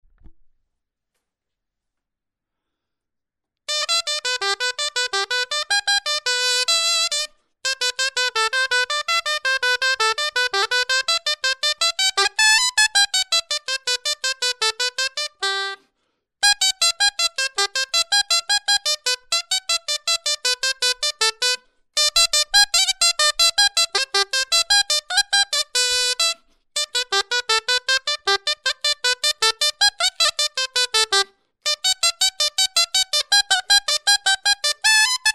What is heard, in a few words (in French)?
clarin etude